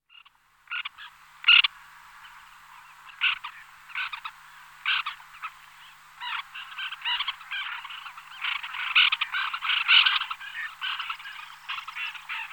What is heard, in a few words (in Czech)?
Rybak belokridly Chlidonias leucopterus